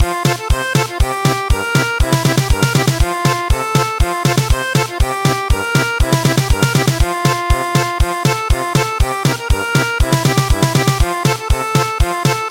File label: German Polka, traditional; Samba lele